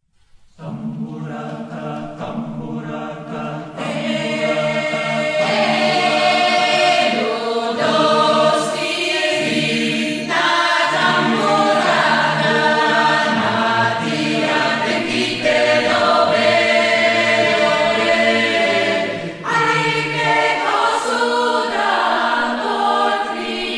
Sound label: tambura